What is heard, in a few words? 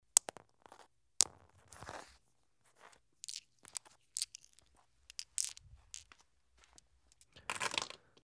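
bidama, glass marbles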